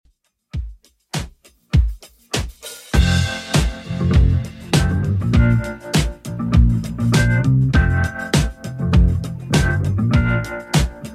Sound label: 70s Redrum 100bpm